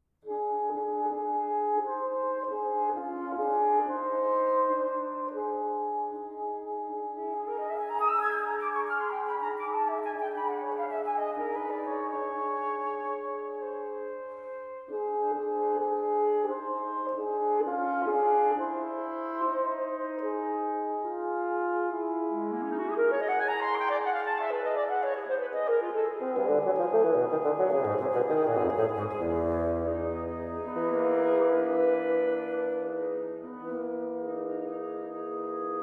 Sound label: Summer Music; S. Barber